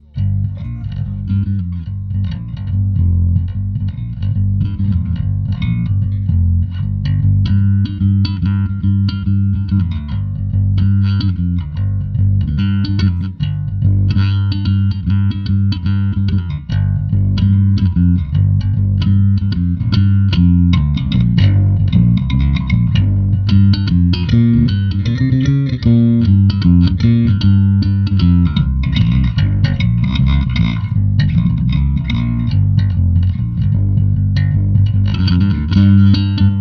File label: hiwatt, bass